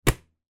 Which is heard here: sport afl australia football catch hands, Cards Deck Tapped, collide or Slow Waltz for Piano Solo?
sport afl australia football catch hands